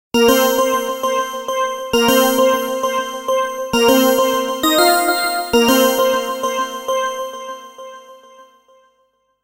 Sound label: Nokia Tones; Prowl; Windows Phone apps